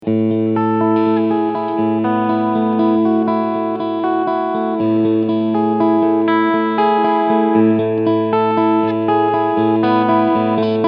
Mooer GTRS Guitars Standard 800 Analog Cleansound Humbucker Middle SC